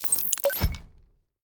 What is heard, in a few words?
Quest Completed; Custom UI Sounds; The Division 1